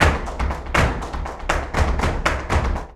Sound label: BULERIAS TAP, Flamenco; Best Service ProSamples vol.46; AKAI